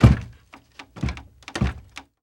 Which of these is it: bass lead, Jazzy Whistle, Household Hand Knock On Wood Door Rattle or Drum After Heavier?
Household Hand Knock On Wood Door Rattle